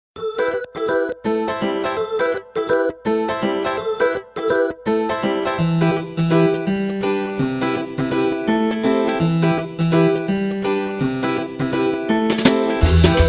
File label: vodafone